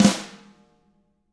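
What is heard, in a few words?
tama flam; ILIO; Double Platinum Drums 1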